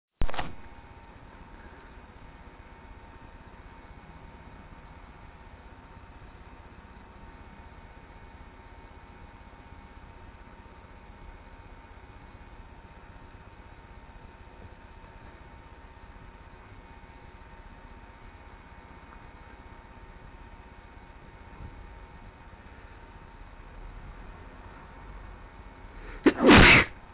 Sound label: stifle try blow, male